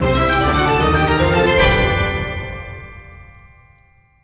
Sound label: big win end; netent